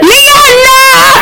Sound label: bass bosted